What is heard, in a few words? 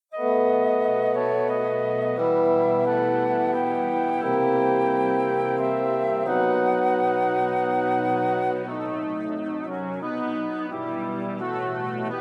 Greensleaves